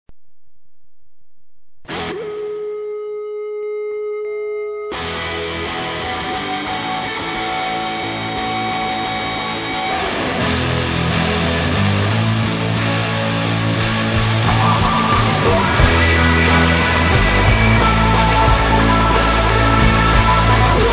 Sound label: Big Muff Pi Muff Power